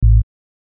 Bass; HipHop

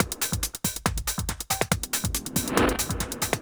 TECHNO BEATS 140

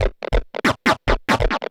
Industrial 140

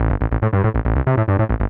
SNTH BASS ELCTR 140 A, Techno

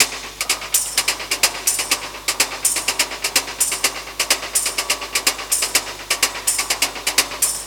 125 Classic Breaks, 125

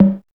BUM TOM

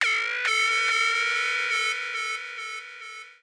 KEY SHOT TEKNO 140 A; Techno2; Singleshots

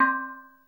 STEEL DRUMS

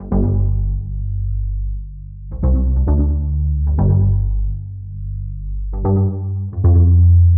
130 G Thick bass; Loops; Touch Loops; BlissedOutBeats

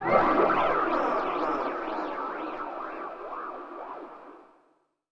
SPACE FX 140; SpaceSounds

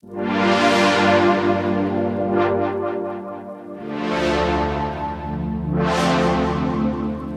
Cm7 bluewake, Music Loops; Touch Loops; BlissedOutBeats

